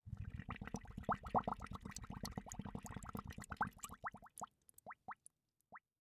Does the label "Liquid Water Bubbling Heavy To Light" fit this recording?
Yes